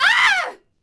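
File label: Horse Archer Die Vox; Civ4 warlords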